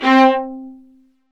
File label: Vas1 Marcato